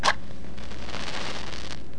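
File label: cock pickup; gunslinger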